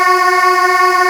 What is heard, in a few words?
Aahs Ab2